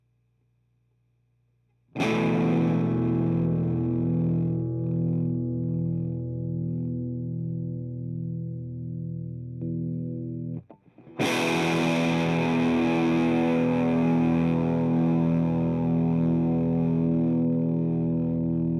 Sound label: all mid low cut position 1; kma minos